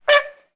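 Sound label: Frog Tree Honk A Single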